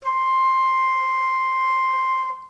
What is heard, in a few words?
C sharp6 baroque, flute